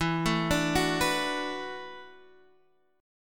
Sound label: E 7th Suspended 2nd Suspended 4th, down, Guitar, Half Step Down; E7sus2sus4